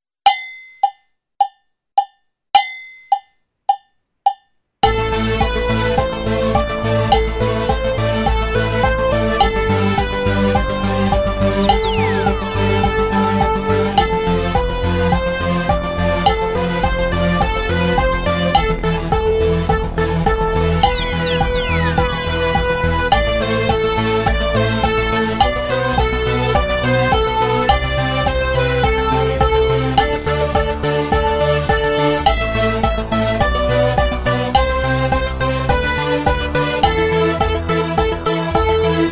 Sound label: Techno with click, Drum Kit; Drum Kit exam